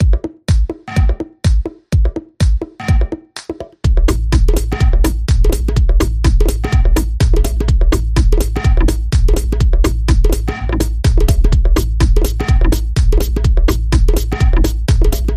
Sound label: Inst